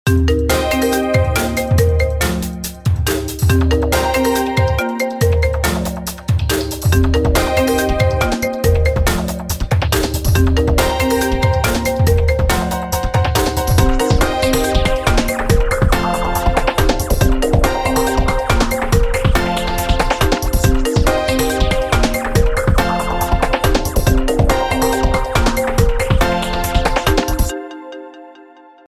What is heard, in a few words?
iphone remix ringtone